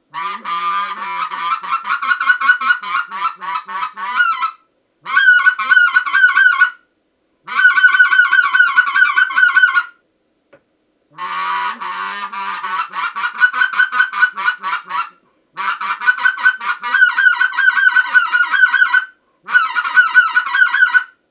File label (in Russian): Zvuk standartnogo akrilovogo manka s latunnoj tonovoj doskoj; RedBone